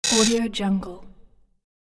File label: wrong answer buzzer notification